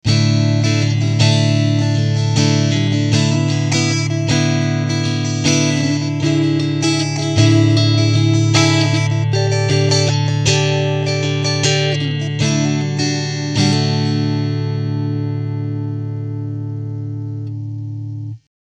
Clean Strumming

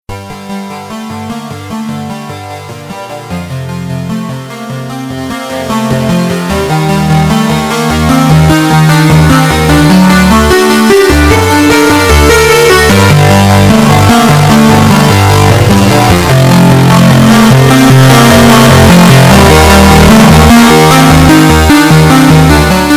iMini Sync Poly Volume Distortion

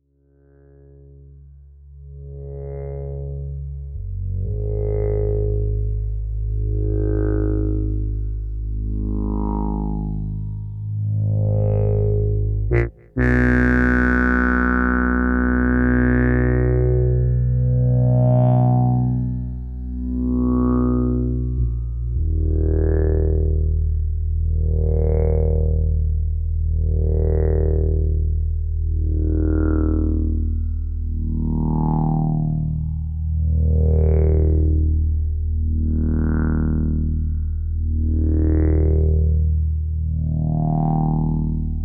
bass phaser

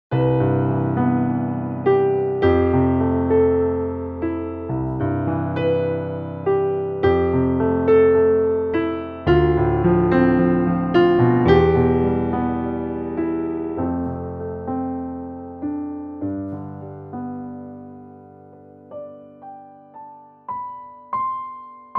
Grand Piano; Vivo S1

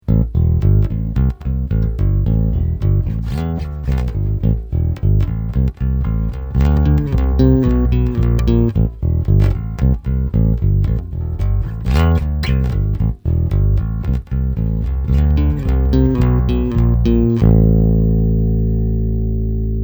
Finger flat

